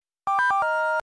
Beam Katana Charge

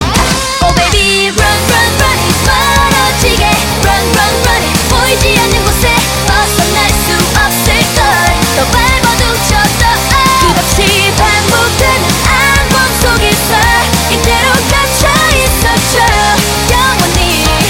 Good Night Chorus

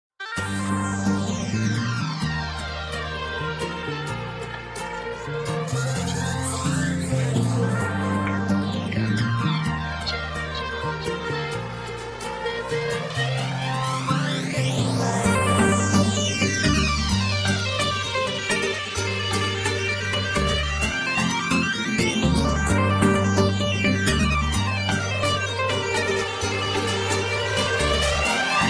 Arabic R N B

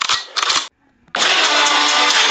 Gun

